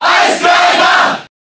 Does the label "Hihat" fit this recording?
No